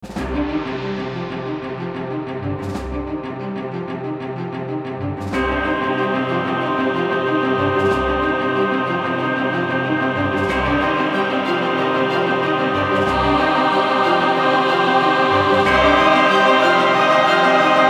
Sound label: Battle